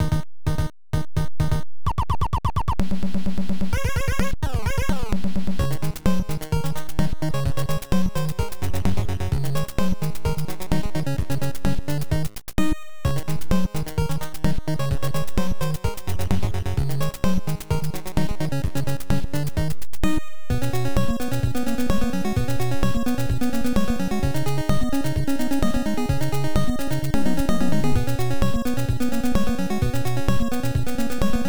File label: Monster Rap; Monster in My Pocket; NES